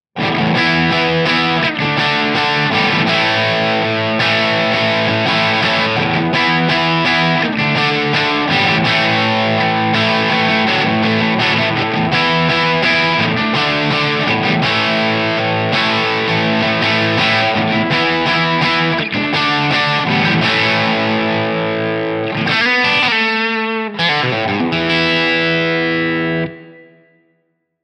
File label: crunch; jn12-100t-spec